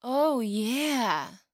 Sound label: Accept